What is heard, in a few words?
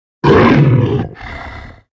librarian damage, mob